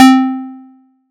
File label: SY QK BASS; ModelCycles